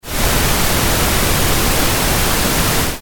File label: noise